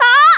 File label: "Ahh"; Talo: "Ahh!"